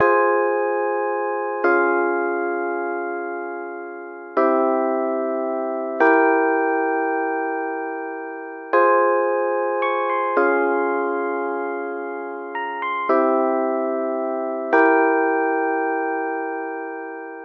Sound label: keys